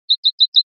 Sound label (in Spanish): rep flin flin, pardillo